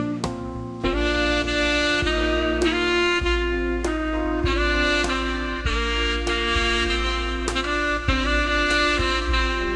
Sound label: ode to joy jazz with saxophone